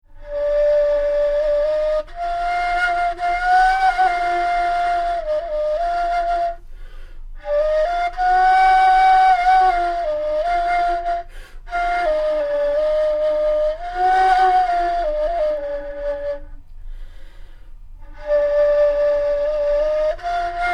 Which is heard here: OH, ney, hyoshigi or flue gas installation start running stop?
ney